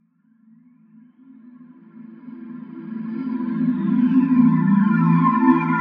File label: Ethereal Riser